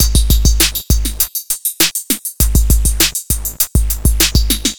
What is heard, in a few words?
Noise Beat; Drums & Drones